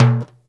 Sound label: TIMBALE, DANZON; InVision Interactive; Percussion Slam